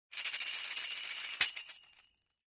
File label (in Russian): eshhe trjasut i udar